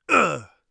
hit, Warrior Voice; Warrior Voice Pack

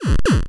square laser cannon; Square Waveform sfx

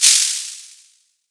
Shake Maracas; FREE SFX PACK VOL 2

